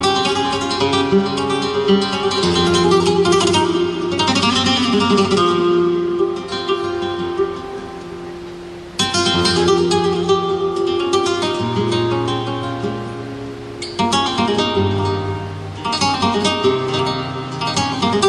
spanish guitar

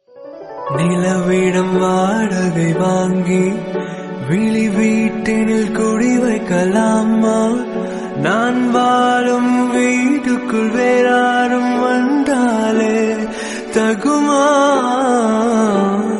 tamil new ringtone

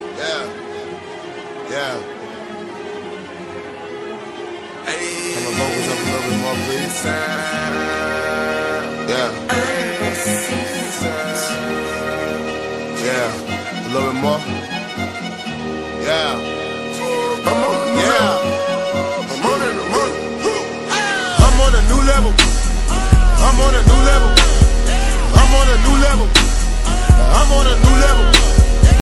best rap ringtone

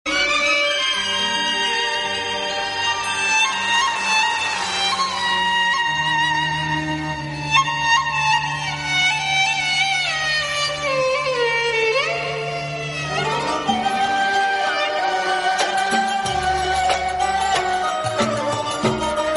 kuwaiti music

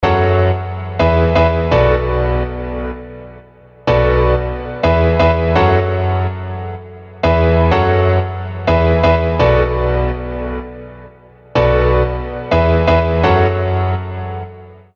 chord

